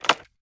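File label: gear rattle weapon large; viper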